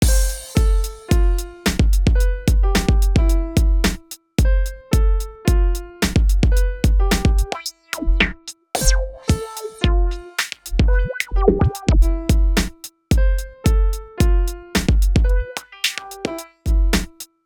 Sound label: Cafeteria Song; diversity challenge; Exit Game